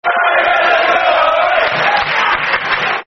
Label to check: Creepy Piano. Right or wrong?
Wrong